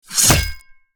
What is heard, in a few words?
Guillotine blade drop impact hit; medieval